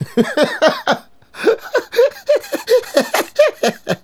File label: Male Laugh, Hip-Hop and RnB Vocals